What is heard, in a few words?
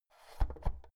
oven knob press out